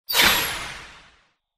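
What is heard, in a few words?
Drone Death Explosion Mid; Titanfall 2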